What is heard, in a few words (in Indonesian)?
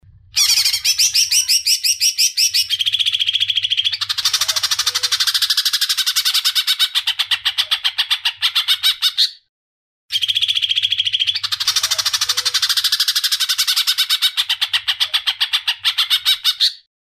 audio cucak hijau untuk kenari isian